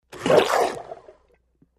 Blender Pulse Lid Pop

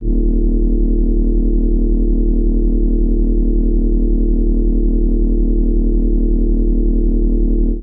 engine hum, engines

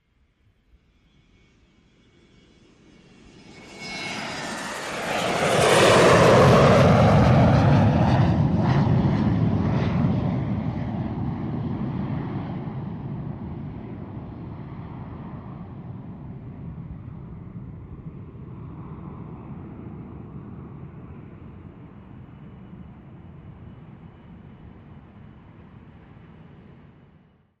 Jet Large By Close, 727

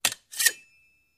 Rapier Draw Draw From, swords

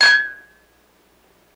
Glass Clink Sngl

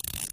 Single Ratchet

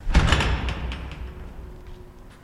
Jail Door Shake; jail & prison doors

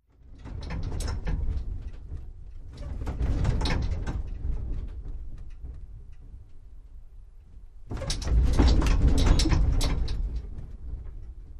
Metal Vib Light To Med, hits & rattles

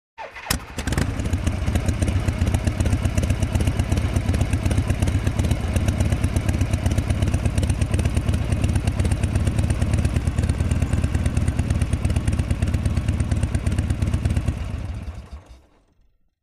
Harley Start Idle Off

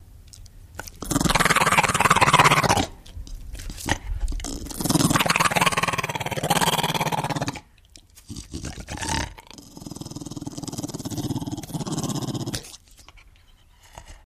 Pit Bull Threatening

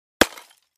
Clay Chimney Vent Hit, concrete & brick

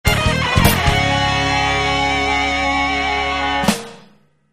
Music Modern Rck Dru, guitar

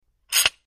Extending And Retracting A Steering Wheel Lock; The Club Lock

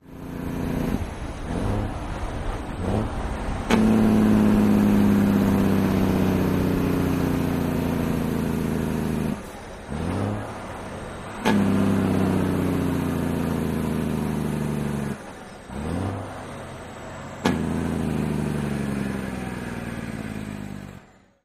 Truck Brakes Pumping

